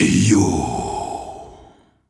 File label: Male Voice, African Voices